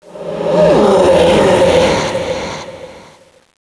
ghoul idle; cstrike

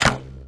bow, weapons; ProjectMobius

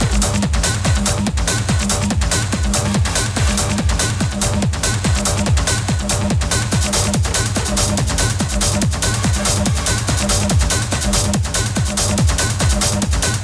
club

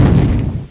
train

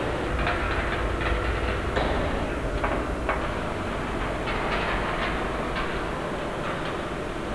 industry, ambience; cstrike

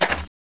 walk footstep; gearbox; demoman

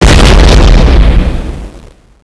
howitzer; firearms

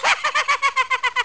hate laugh

